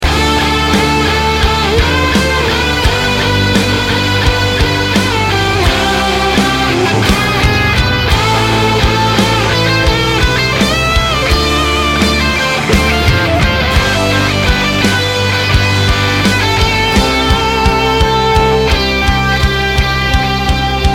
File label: guitar solo